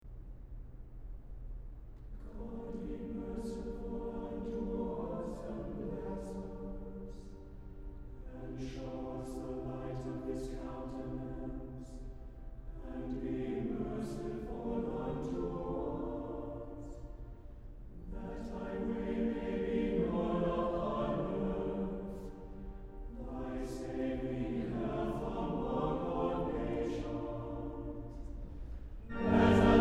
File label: Psalm 67; God Be Merciful Unto Us and Bless Us